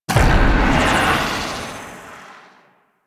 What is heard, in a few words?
Terror Totem Impact; Warframe; Necro